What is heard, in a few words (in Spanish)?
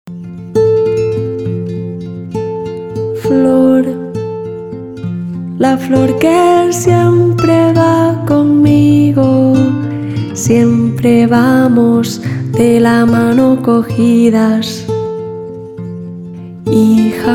SMS MUSICAL FLOR